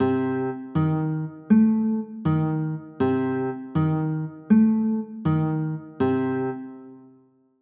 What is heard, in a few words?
c dim7, chords